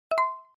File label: game alert